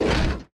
thruster mechanic stop; Factorio

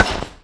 asteroid damage metallic medium; Factorio

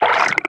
wriggler idle, enemies; Factorio

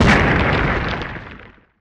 asteroid damage carbonic huge; Factorio

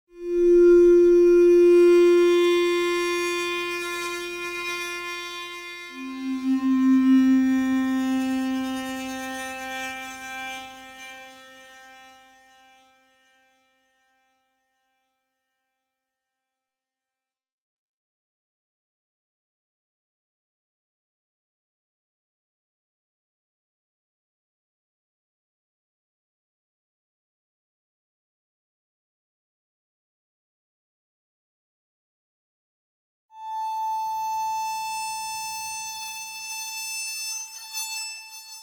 ambient; Factorio - Space Age; aquilo; Aquilo 7

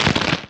asteroid damage small; Factorio

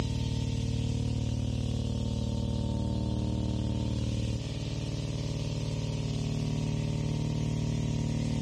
thruster mechanic; platform thruster; Factorio

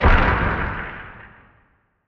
asteroid damage interstellar big; Factorio

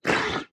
wriggler attack; enemies; Factorio

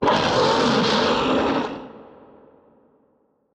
demolisher roar, enemies; Factorio

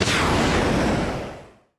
rocket turret launcher; Factorio

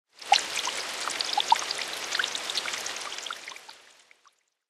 rain on water; factorio

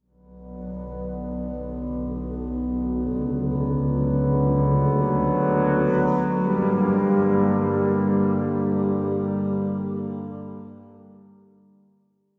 ambient, vulcanus

